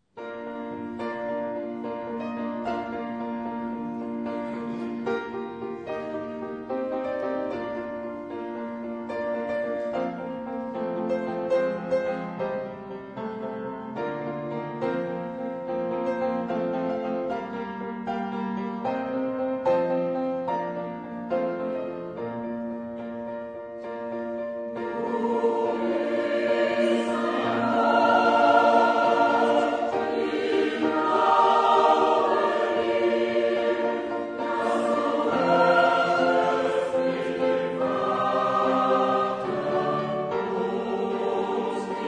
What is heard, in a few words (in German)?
chormusik